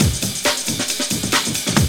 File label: MODUL BREAK, DIRTY DR. LPS